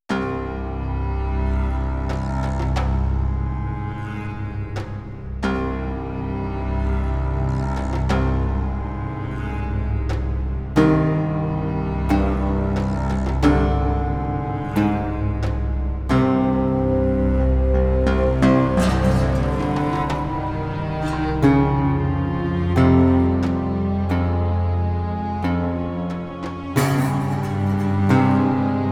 Calm

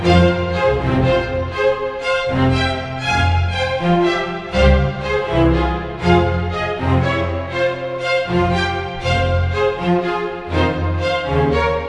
LOADING; brothers in arms earned in blood

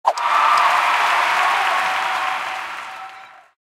Event Unlocked Tv; Candy Crush Soda; Rocketrace

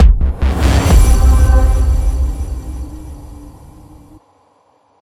Transition

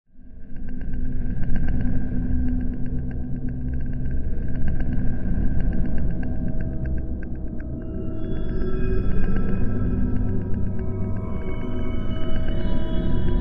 Noise; Dreambreak

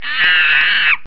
Monkey scream

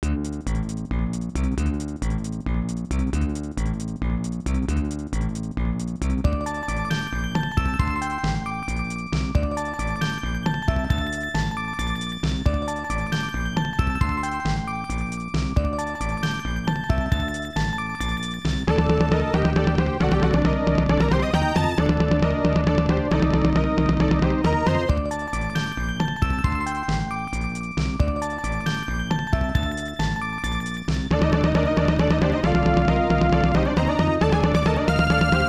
Dungeon; Sword World SFC; SNES

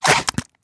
Swamp Jumpers Spit Attack; we are the dwarves gamerip 2016

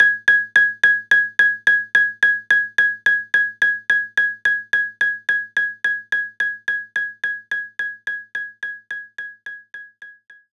Organ Perc D#3

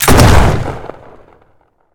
Gun Shotgun Shot; JASEM: Just Another Shooter with Electronic Music

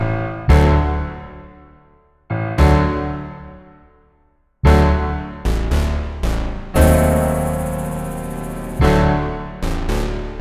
Tango; mass effect 3 citadel gamerip 2013; Silversun Strip

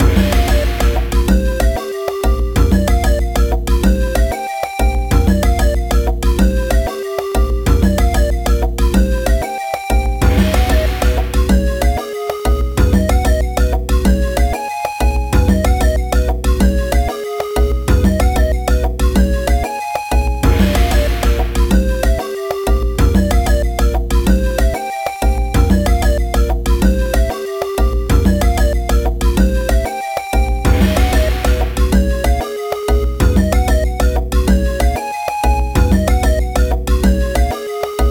Scenario Clear; zoo tycoon 2

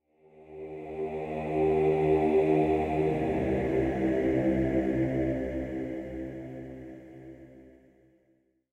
amb hymns tonal; gamerip; blackfaun

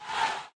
Succub Mele

